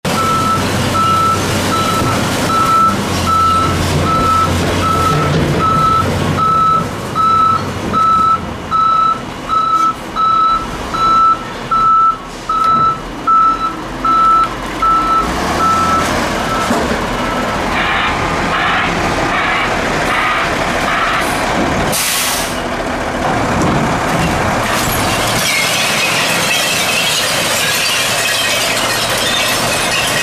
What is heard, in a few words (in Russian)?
musorovoz